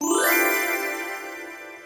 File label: get present; game tuituibi